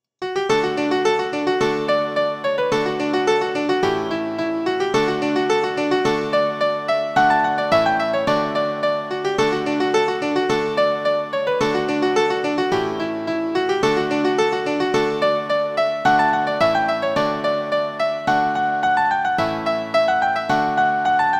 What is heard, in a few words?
Set Chords 108bpm; Soldiers Joy